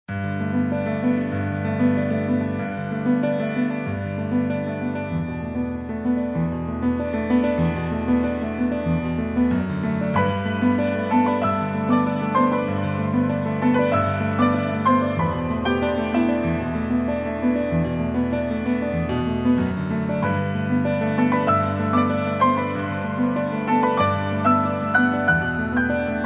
Light Jazz

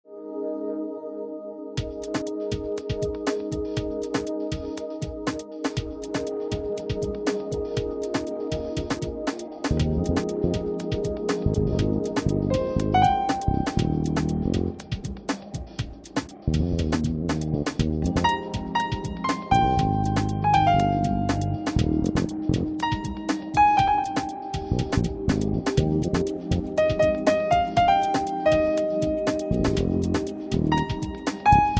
light jazz

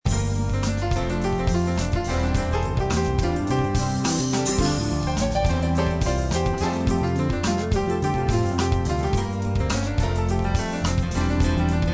Light Jazz

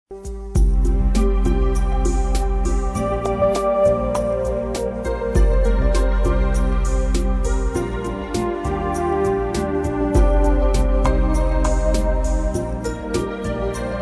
light jazz

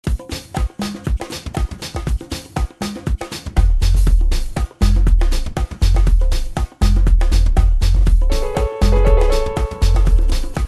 contemporary urban pop ragga house

